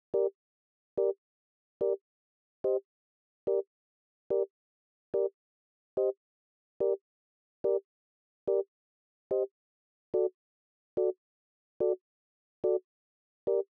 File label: Organ